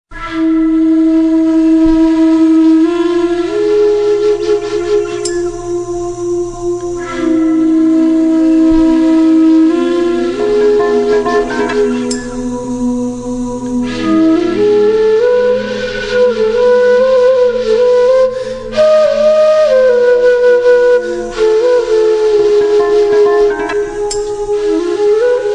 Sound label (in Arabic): مؤلفة فرعونية